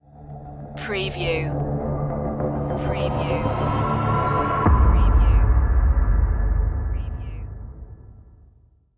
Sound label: creepy sting